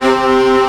ORCH C0 PP, Orchestra; Sound On Sound; Peter Siedleczek's Orchestra

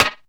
hi-percussion, techno; Techno ID; single-drums

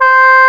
OBOE C 4; AKAI S-Series CD-ROM Sound Library VOL-8

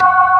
MID FAST, ToneWheel Organ; E-MU Formula 4000 Series Vol. 3; Analog Odyssey

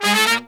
Pop & Funk Brass

